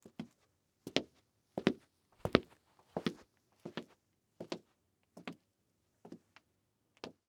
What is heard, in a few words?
Foley Footstep Cowboy Boots Walk By; Foley Footsteps